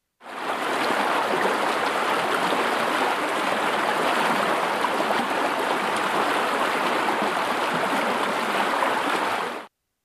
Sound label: creek water sample